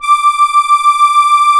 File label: DIATONISC, AKKORDEON; Best Service; Alpine Volksmusik